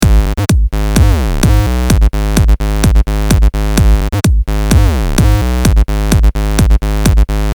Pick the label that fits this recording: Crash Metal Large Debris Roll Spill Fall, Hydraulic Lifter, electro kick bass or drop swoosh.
electro kick bass